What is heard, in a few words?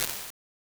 Snare Tap Gate Hard; Convolution Reverb; IRs; Made for Drums; Ableton